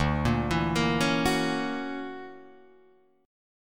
D Augmented Major 9th, Guitar, Open D

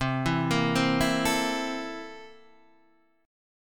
C 13th C down Guitar Half Step Down

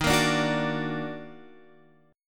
D sharp Minor Major 7th, Guitar Open F; strummed

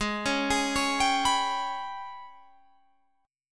G sharp Minor Major 7th Double Flat 5th

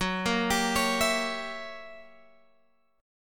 Gb 7th Flat 9th Gb Guitar Open F; 18,17,19,20,19,x; Listen to Gb7b9 arpeggiated

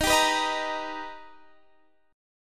B Augmented Major 7th Eb, chord

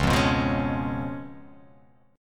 C sharp 7th, C sharp, down

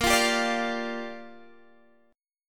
A sharp 6th, Guitar Standard; A#6 strummed

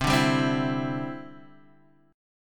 D 7th Suspended 2nd Suspended 4th C

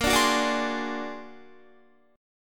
Bb Augmented 9th Bb, Guitar; Bb+9; strummed

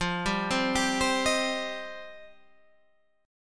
F 7th Suspended 2nd F down

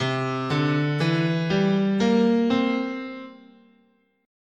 C Dominant Pentatonic, scale

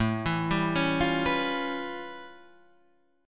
A 7th Flat 13th; A7b13 arpeggiated; Guitar; JGuitar

